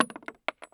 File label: Inf Weapons; Red Orchestra 41-45